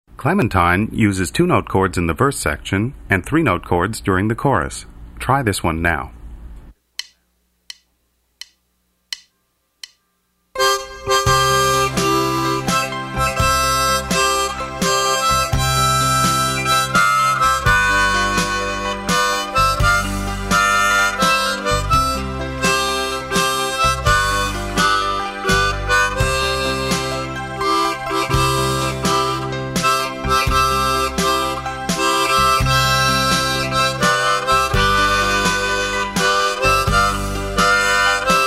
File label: clementine, harmonica